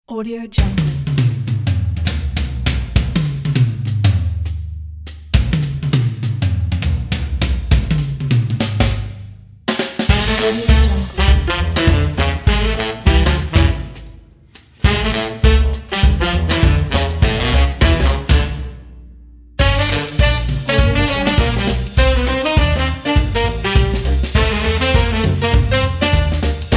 big band swing

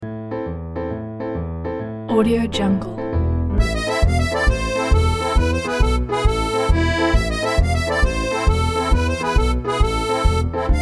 italy tarantella

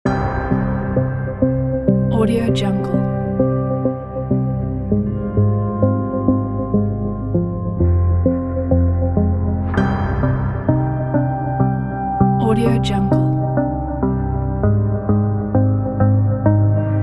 epic hybrid cinematic trailer

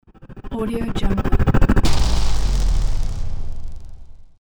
trailer hit

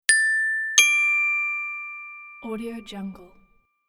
round winner bells

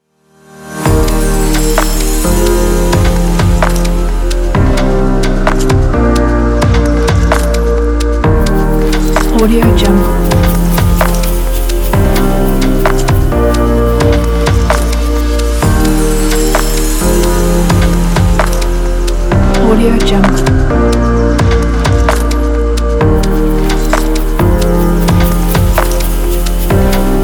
ambient travel vlog

